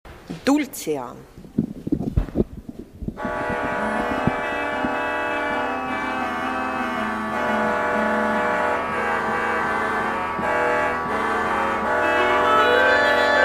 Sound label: Dulcian, Pfeifen; Toene